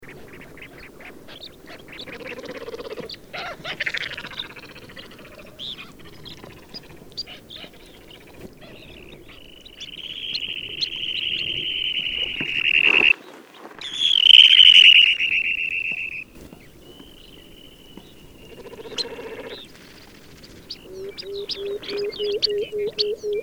Pectoral Sandpiper, Calidris melanotos; shorebirds rails

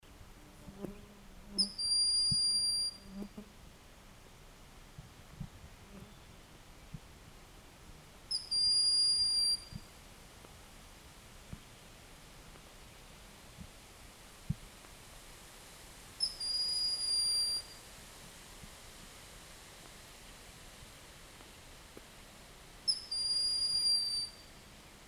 Broad-winged Hawk, Buteo platypterus; raptors

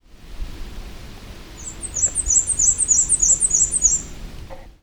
Black-and-white Warbler Mniotilta varia; warblers

